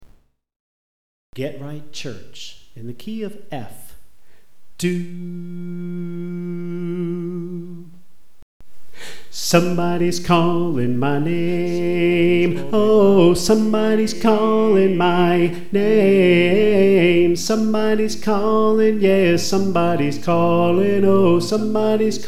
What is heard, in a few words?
Soprano